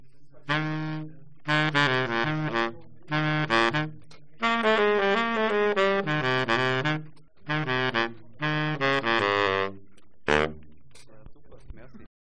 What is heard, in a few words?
baritonesax